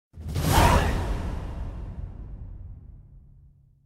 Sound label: Quest Accept; TW3